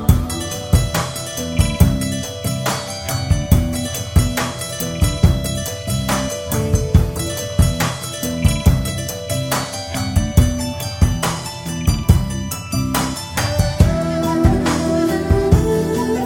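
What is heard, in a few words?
BG Music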